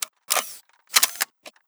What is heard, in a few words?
smg reload; turcotte